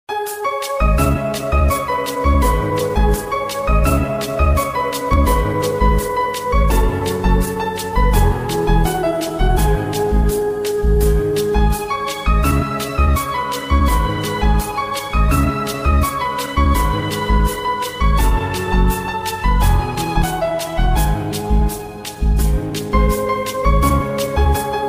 love bgm ringtone; uthayam nh4

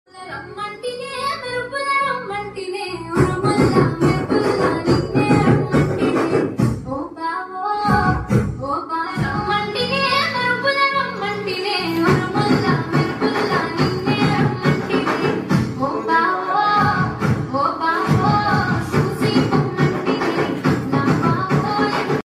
folk song haldi ceremony

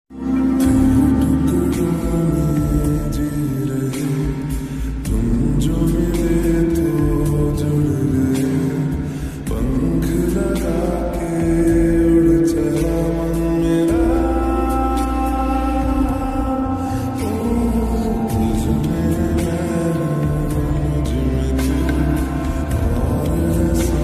slowed reverb lofi ringtone, love ringtone

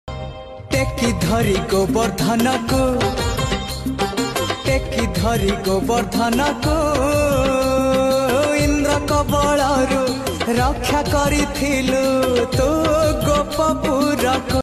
odia bhajan; jagannath

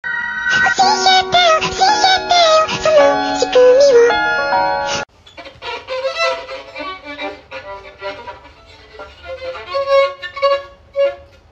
huge violin or tiny double bass

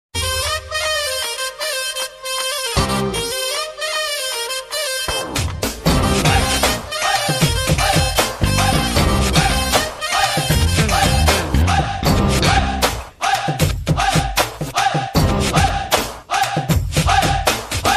bgm ringtone, tamil; puthupettai

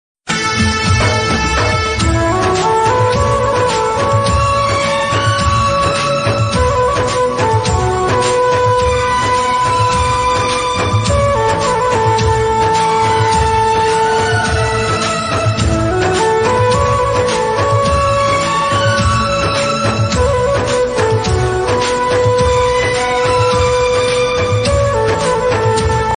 flute ringtone instrumental ringtone

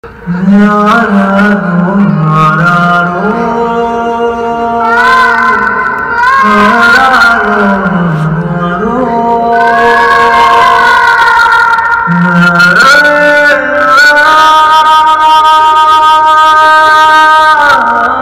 emotional bgm ringtone sad bgm song ringtone; jawan movie; shahrukh khan jawan movie

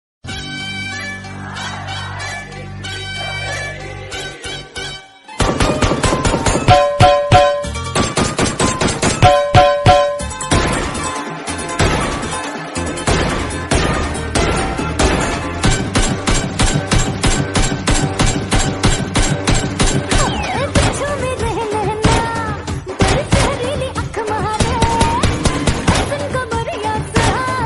attitude ringtone bgm ringtone

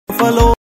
haryanvi song ringtone

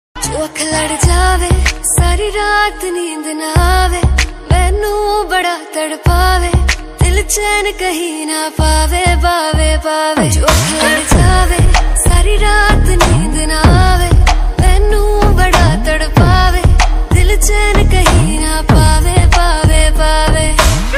ringtone, bollywood song

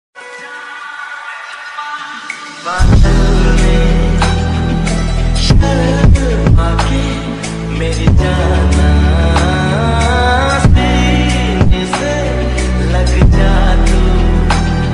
lofi version ringtone; hum dil de chuke sanam

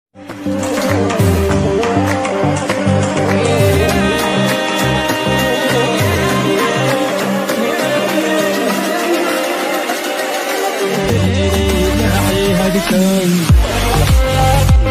english ringtone attitude ringtone

